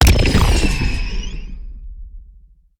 tentacle

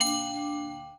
ui card bounce

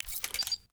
ui laser

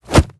punch

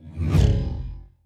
whoosh

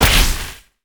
imp lightning

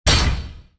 spear drop

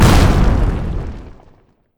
mixed firemagic explosion

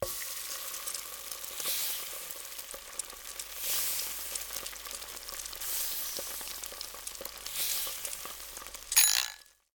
beef cooking

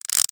mechaxbow draw

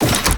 ore throw

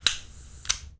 lighter

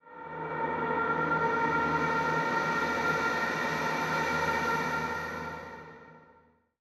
air buzz

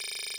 tick short

